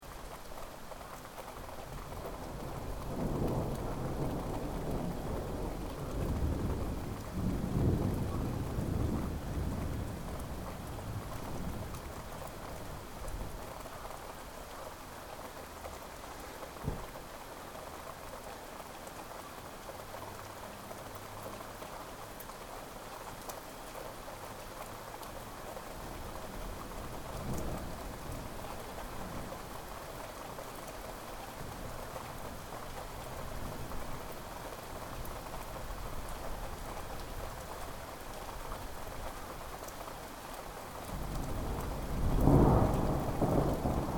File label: THUNDER STORM RAIN LIGHT; Elemental weather effects